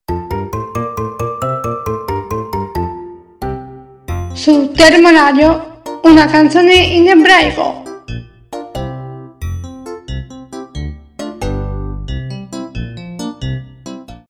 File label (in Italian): Canzone in ebraico